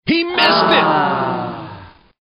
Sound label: play Sad Miss